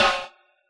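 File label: snare drum Jungle, Yamaha DJX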